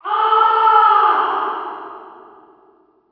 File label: inmate agony